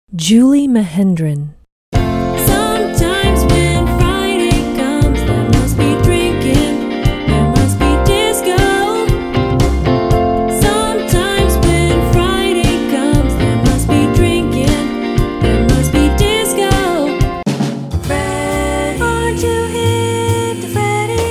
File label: jingle session singer